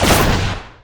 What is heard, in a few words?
Enemy Waterplant Tentacle Shot; gamerip